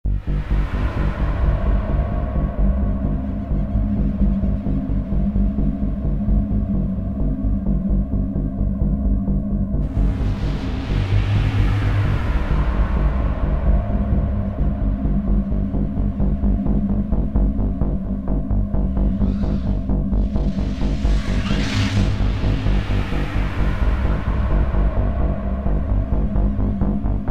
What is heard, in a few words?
Noise; Quake 3